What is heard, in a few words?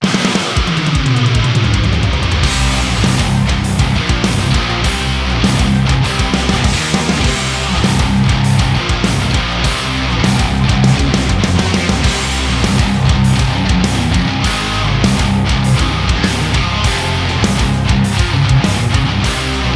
higain cabs; starchaser